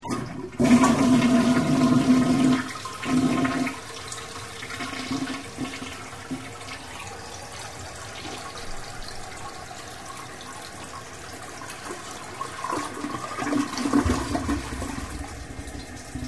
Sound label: Flush